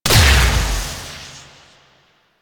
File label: Fire Medium; Weapons; Warframe; Duviri; Incarnon Adapters